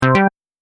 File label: Correct Double Ding